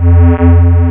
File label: REACTOR; duke